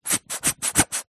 hair gel pouch squeezes empty just air; household; zapsplat

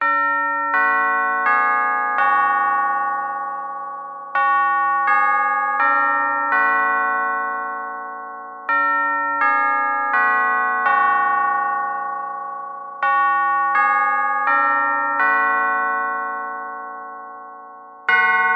clock westminster chimes designed strike 12; household; zapsplat

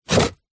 card cereal box rip

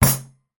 impact fist on table with cutlery on; sound effects one

